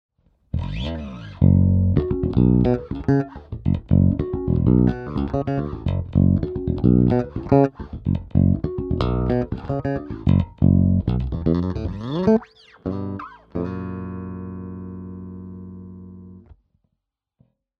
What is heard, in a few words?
Finger style presence